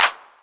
808 Clap; Novation Drumstation

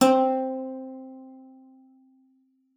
spinet; Xsample VOL-7

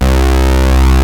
MINI BASS, ENSONIQ ESQ-1; Rubber Chicken Software Sample Bank

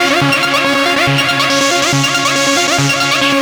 NRG Arp, trance

